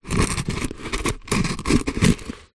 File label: foley stone small scrape rub on grit dirt on plastic surface; zapsplat